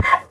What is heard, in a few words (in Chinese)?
驴受伤声